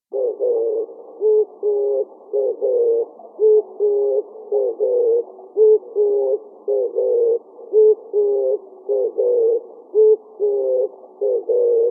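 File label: oriental turtle dove voice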